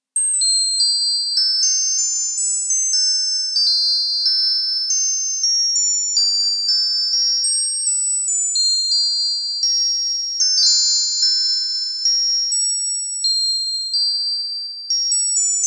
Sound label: Wind Chimes, Musical tinkling wind chimes; Multimedia